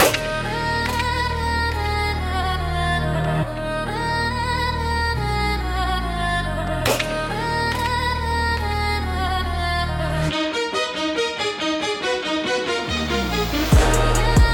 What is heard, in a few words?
energetic trap for fitness 808 drums 140bpm